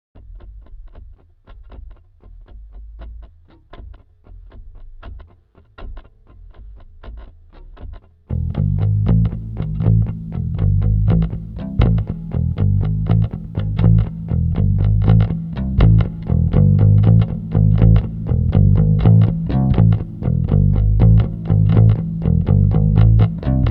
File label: bass line; effectrode